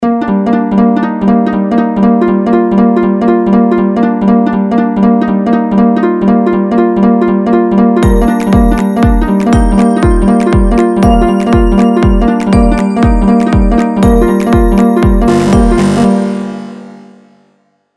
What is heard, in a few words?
Shuffle; Lethal Demo 2